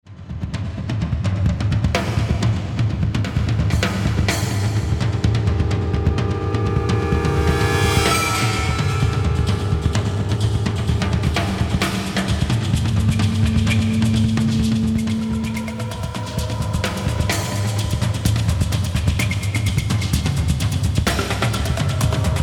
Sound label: Tribal Madness